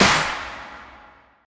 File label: Clap C# Key, Royality free clap single shot tuned to the C# note. Loudest frequency: 2773Hz; percussion; single hits one shots